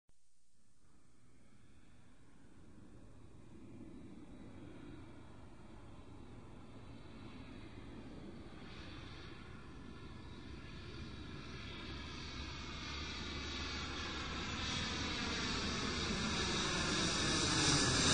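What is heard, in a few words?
jet aircraft flyby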